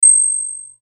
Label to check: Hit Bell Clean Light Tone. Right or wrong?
Right